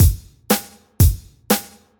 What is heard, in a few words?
kick snare hihat